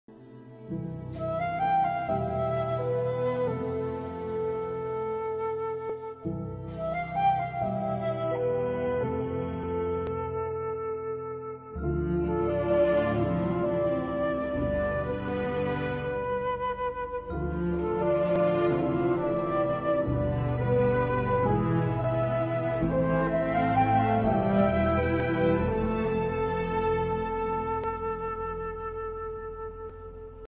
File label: sad bgm ringtone song sad ringtone bgm ringtone; aavesham